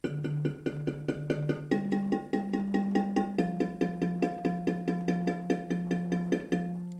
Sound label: bari transition, marimba